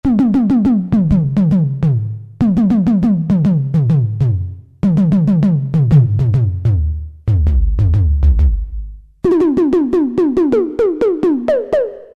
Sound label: Percussion, Cheetah MS6